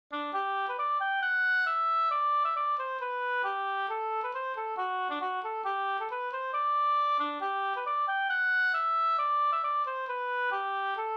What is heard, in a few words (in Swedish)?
schottis; Tornevallaschottisen av Jonathan Borgsjo Og